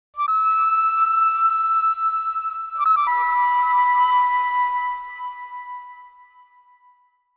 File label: Sound of a Flute; Okami Original Soundtrack